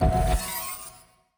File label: designer magic; CrossCode